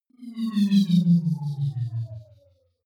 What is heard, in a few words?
wobble drop hover